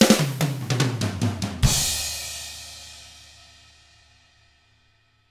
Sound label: rock drums